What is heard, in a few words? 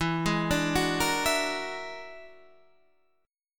E 9th Flat 5th E down Guitar Half Step Down; E9b5